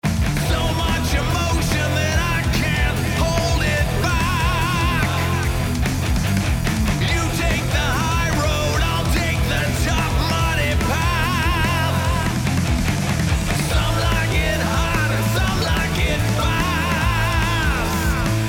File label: Hard Rock Mixed